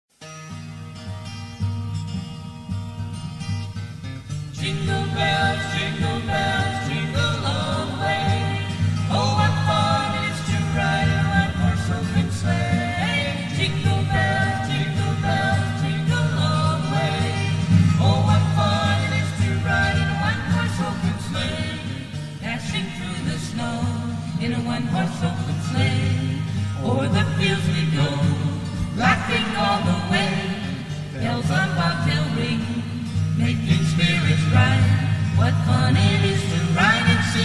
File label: Jingle Bells